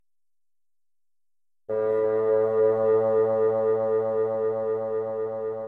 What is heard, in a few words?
A3 Basson